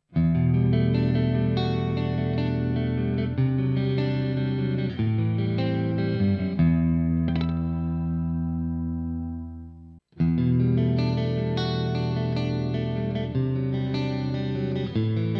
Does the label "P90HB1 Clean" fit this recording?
Yes